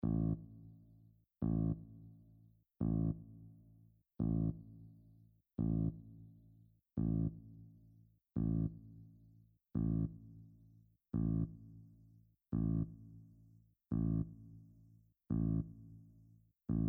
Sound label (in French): Basse